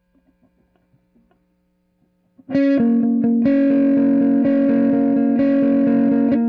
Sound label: Guitar Hum after